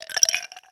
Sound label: Ice Cube, notifications; LG Velvet